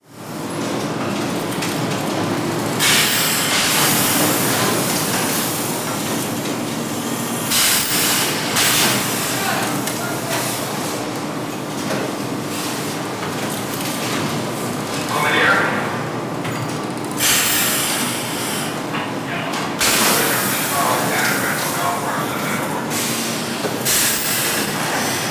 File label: ambience factory interior voices in background; sound effects one